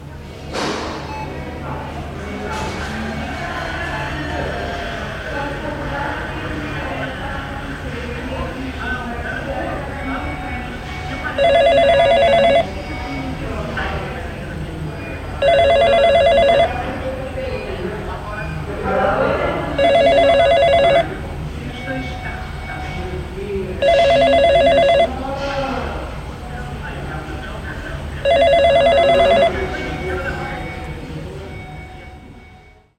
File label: hospital ambient sounds; curbing hospital noise